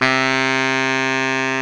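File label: SAX Baritone Sax C 3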